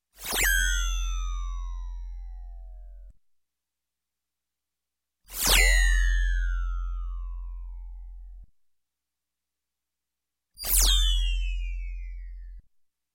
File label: Space Space Rim Shot, Electronic; Sound Ideas 2000 Series